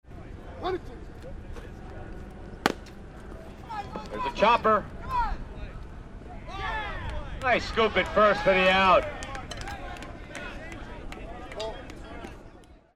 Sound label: GAMES